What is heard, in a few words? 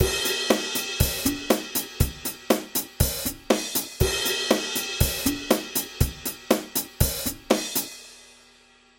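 kick snare hihat cymbal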